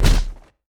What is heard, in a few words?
wolfman vs stone; LoL; warwick